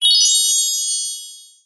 fantasy magic glissando rise bell tree style fairy very high pitched; zapsplat